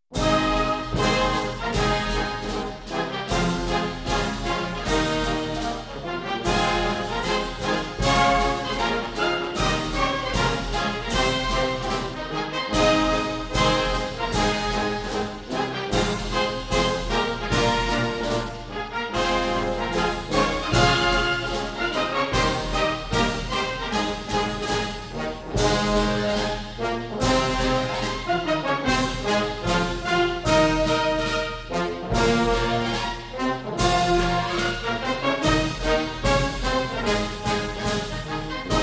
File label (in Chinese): 阅兵进行曲; 运动场